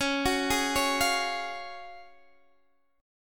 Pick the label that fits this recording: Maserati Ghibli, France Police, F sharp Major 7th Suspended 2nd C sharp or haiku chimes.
F sharp Major 7th Suspended 2nd C sharp